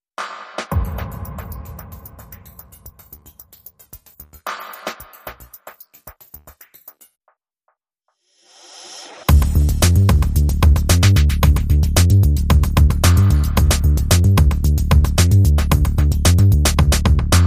Drums and Bass Mix

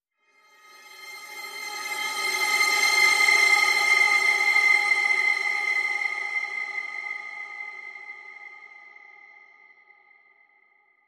Strange Drama Transition

